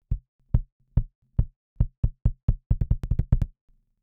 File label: kick Percussion Snap; Extractor